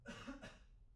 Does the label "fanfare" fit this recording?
No